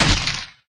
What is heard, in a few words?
crylink impact